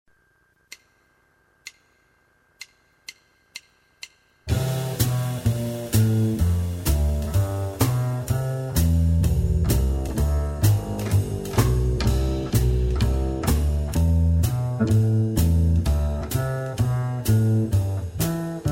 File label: Backing, jazz